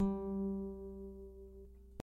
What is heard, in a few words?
string4 g2